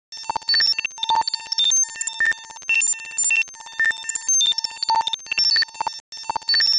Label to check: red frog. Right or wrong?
Right